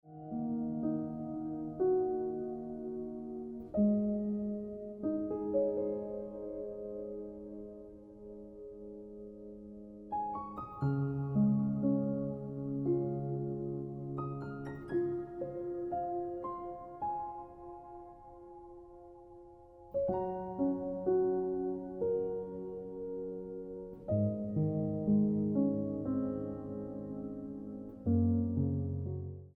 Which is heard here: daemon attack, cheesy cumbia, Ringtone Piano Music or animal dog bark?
Ringtone Piano Music